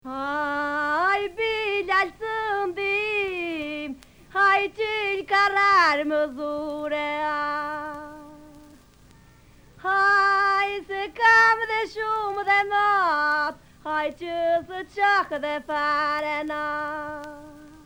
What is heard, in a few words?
funeral lament (lamente funebre)